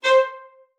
VIOLIN SPICC, C5; Xsample VOL-5

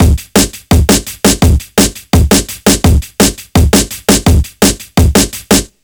169 BREAK, Explosive Jungle; USB Soundscan vol.05; AKAI

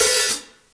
hh hit, HAT, Drum Kits; Big Fish Audio - DOM; Redrum

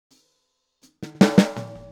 Boogaloo Snare, 82 BPM; Sonic Foundry (Sony Creative Software); Drums from the Big Room The Mixes

